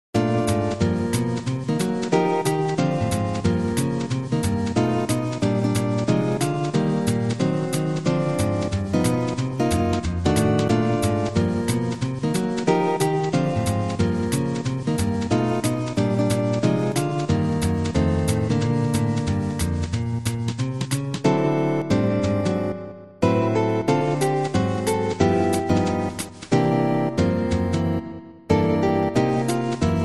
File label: walk, guitarist, jazz; brush track for percussion; slower tempo; MIDI reverb